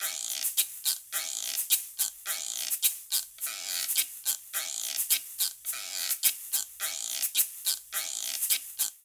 Charanga Cha-cha-cha Shaker Guiro 106bpm; Latin Percussion; Ableton; Factory Packs

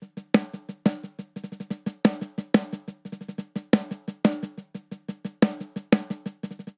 Straight Five Rock Snare Only 5_4 177 bpm; Ableton; Factory Packs; Drum Booth

